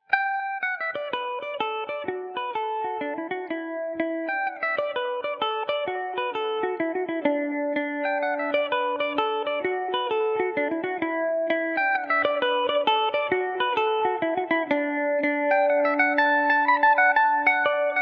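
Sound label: D major revelation, Irish mandolin; bill the weavers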